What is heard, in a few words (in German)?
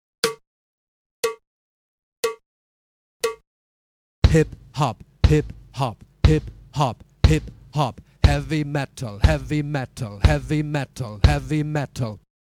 Uebung, schlagzeug